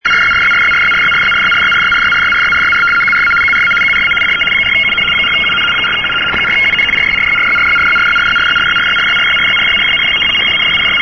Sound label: rtty 75 bd; uno jerusalem'4un'